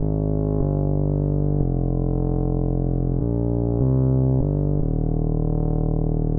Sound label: Bass before Bounce